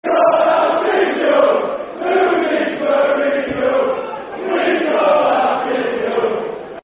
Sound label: Football Chant, Soccer Songs; Who Need's Mourihno; Scunthorpe United Scfc